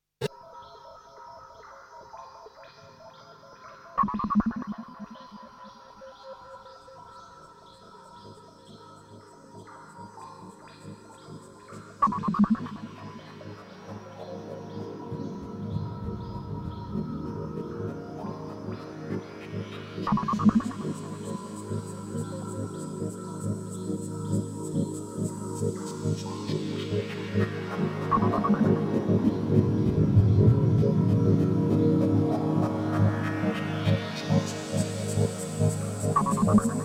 medical soundscapes thermodorm

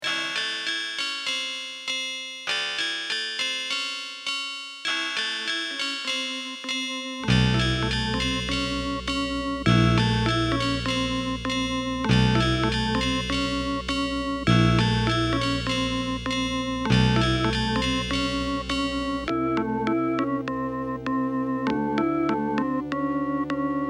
metal song

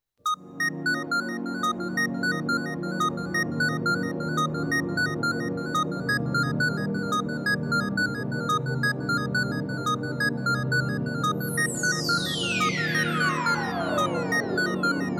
jungle progressive funk

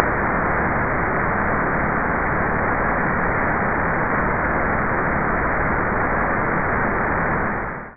Noise; amigascne

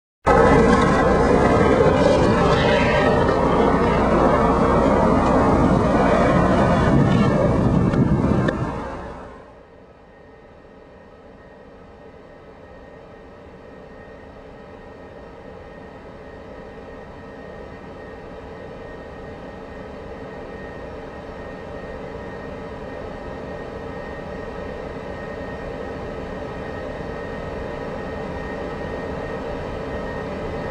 noise scratch

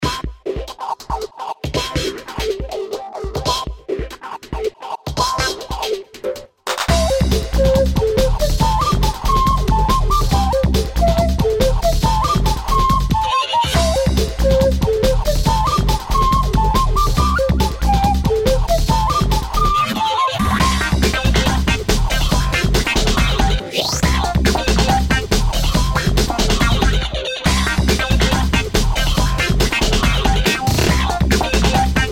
funky shit

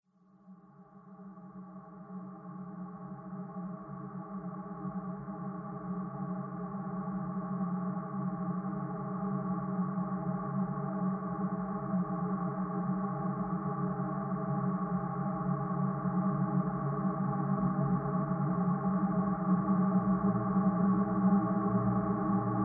noise singer; earlabs

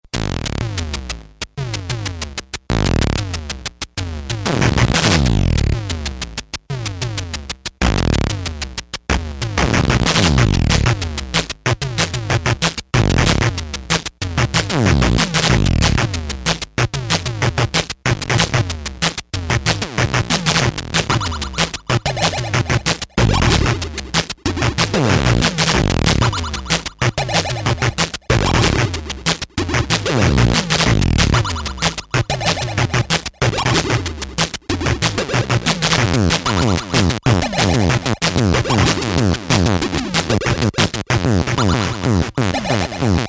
bass

